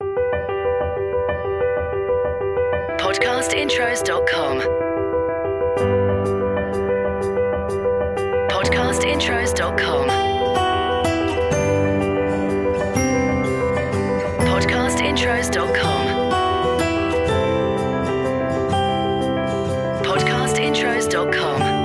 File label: CORPORATE; Podcast Watermark